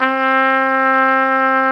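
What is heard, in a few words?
trumpet, harmon dry; Solo Brass; Trumpet 5-7; Tp 5 RCA Jaz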